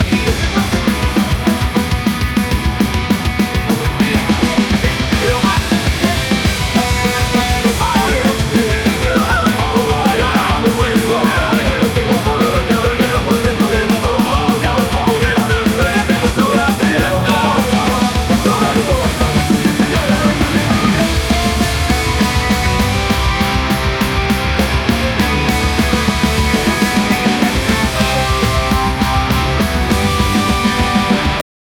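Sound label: strange and meme punk rock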